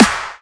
normal hit clap

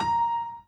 Piano Chord

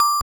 BELL

